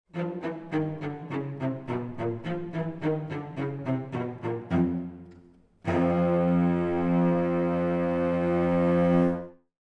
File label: Orchestra, Jazz for piano